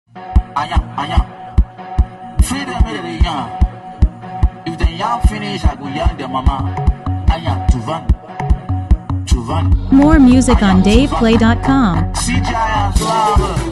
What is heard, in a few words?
Cruise Beat